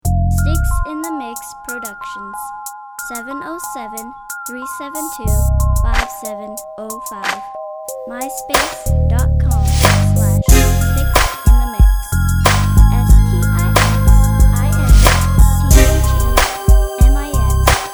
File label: mob blues